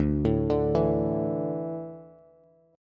D Suspended 2nd D down Bass; arpeggiated

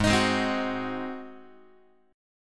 Ab 7th Ab Guitar 4A,4E,5A,5D,5G sharp,6C sharp; Ab7 strummed; JGuitar

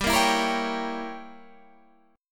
G Diminished 7th, Guitar, Standard; strummed; JGuitar

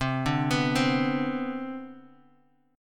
C Major 7th Suspended 2nd, C, down, Bass Standard; CM7sus2 arpeggiated; JGuitar

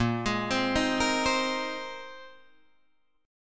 A sharp 9th Suspended 4th A sharp Guitar Drop D

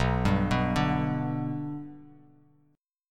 B Minor Sharp 5th, Bm#5 chord; arpeggiated; JGuitar

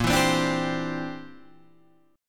C Major Add 9th Bb down Guitar Standard; strummed; Cadd9

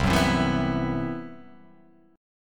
C Major 7th Flat 5th C

